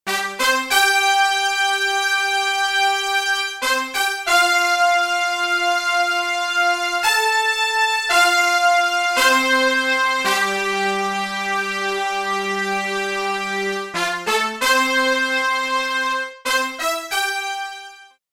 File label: Brass Section Ensemble; Aeternus Brass; Syntheway